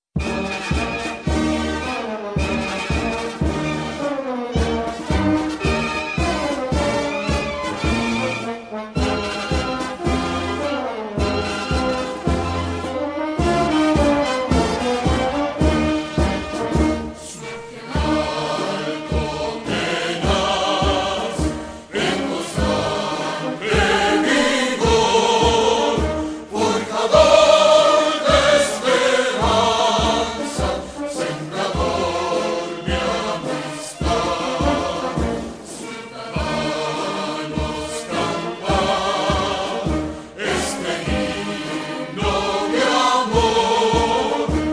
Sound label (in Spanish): Himno a el alto